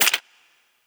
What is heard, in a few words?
Weapon Foley